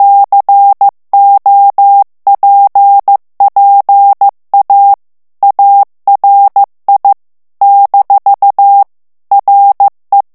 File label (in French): ITALIEN texte clair vitesse 14 mots minute, morse